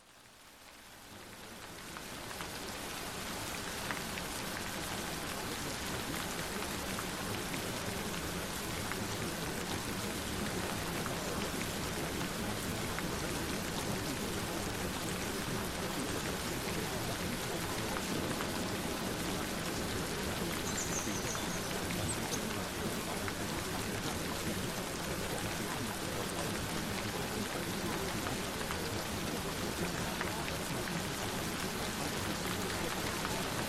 Rain Sounds, Anti-Aging and Skin Repair Freque; Have Beautiful Healthy and Youthful Feet

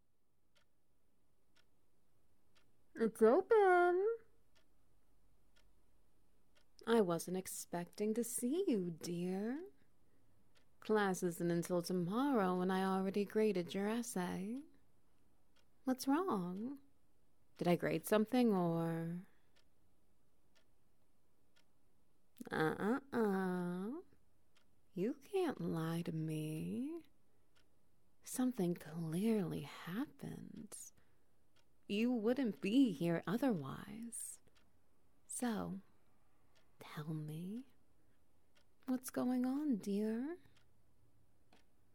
Ambience; Ulterior Motives

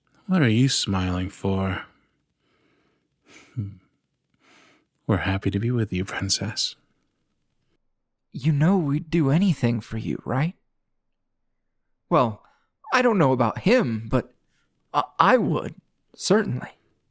Ringtone; Mercy

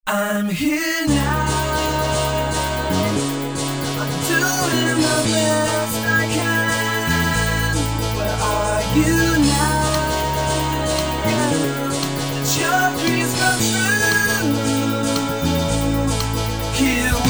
CPS-2 Vocal Remix; Tekken 5; SupremeMIDI

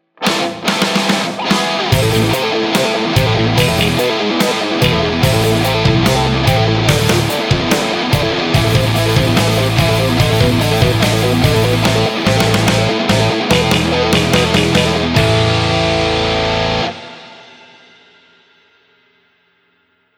Neoclassical Metal

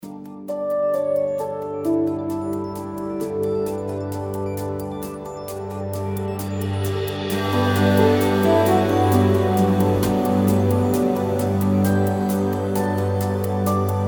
Neutral Idle Crystalline

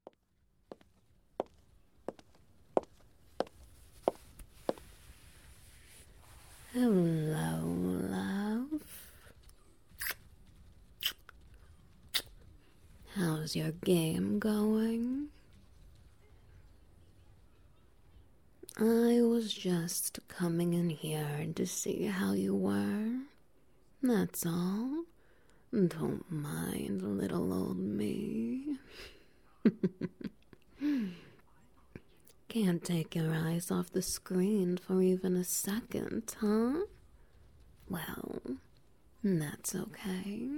Wet Noises; Getting your Attention; Gameplay

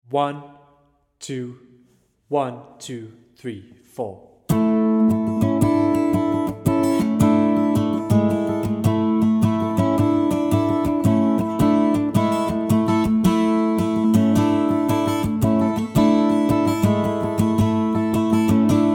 Grimstock, Guitar Full Speed

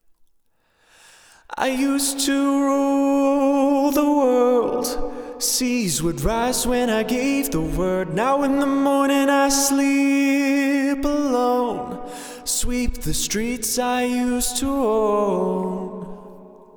vocal

